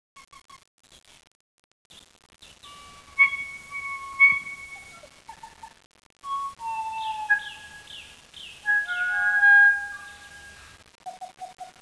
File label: kokako